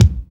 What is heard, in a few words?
BD, BD Dance